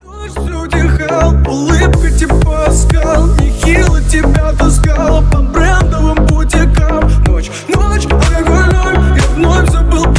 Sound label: remix